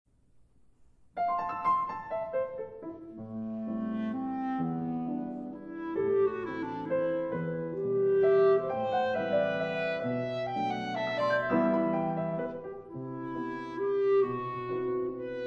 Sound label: Clarinet Sonata